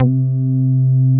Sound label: SH 101 BASS; BASS SOUNDS